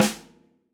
snare, gretsch kit

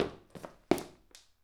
footstep

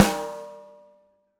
snare, gretsch kit

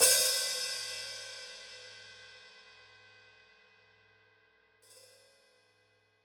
hihat open, gretsch kit

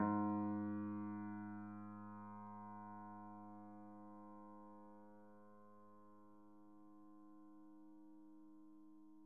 piano mf, steinway

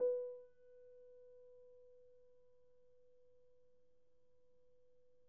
piano pp, steinway

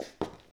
footstep

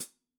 hihat foot, gretsch kit

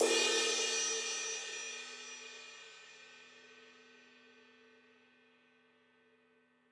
ride, gretsch kit

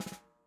snare drag, gretsch kit

